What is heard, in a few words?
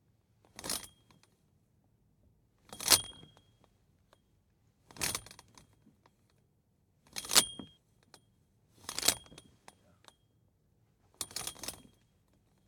curtains; fvtt sonniss gdc audio